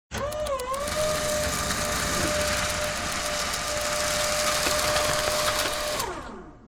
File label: Electric roller shutter close perspective; blinds